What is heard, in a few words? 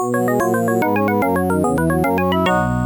Upbeat; MaxTrax